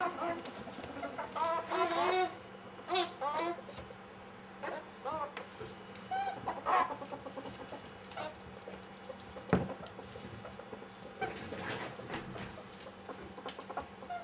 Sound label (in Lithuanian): seskes mamos guguoja